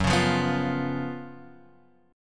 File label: F sharp Major 7th Suspended 4th F sharp, Guitar Open F; strummed; x,1,1,0,2,0